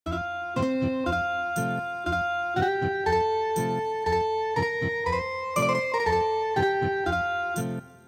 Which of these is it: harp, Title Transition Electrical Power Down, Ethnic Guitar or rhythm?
Ethnic Guitar